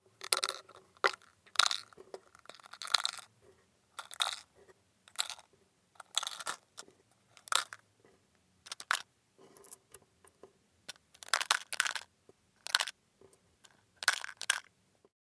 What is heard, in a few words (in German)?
Lutschbonbon